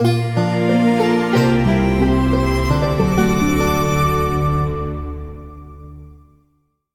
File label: victory stinger